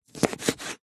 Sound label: food lemon slice cut